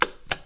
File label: Flicking A Light Switch Off And On